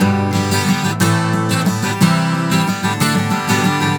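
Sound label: 120 G GUITAR, Celtic; USB Soundscan vol.42 - Celtic Flavours; AKAI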